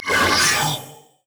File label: wraith angry; LoL; monsters